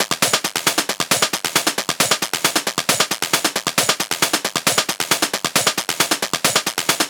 Perc Loop 135, Techno; Ghosthack; Techno Sounds 2025

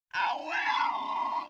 LoFi Synthetic Bird Caw

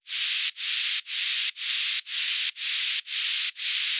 White Noise FX (Pumps) - 120 BPM

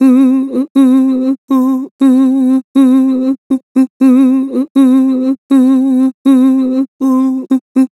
Vibrato "Ooh", Harmor Vocals

